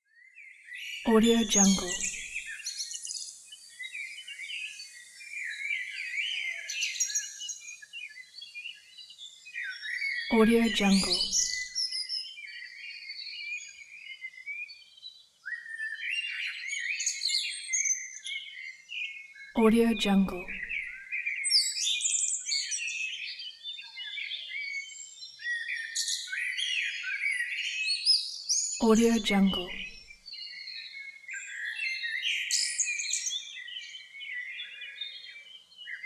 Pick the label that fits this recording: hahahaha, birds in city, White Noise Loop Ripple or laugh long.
birds in city